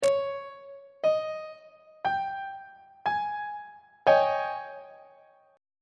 g sharp major 7th suspended 4th 1st inversion on treble clef